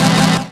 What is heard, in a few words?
DM ORG1, Dance Mega Synth